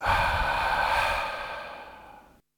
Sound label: Breath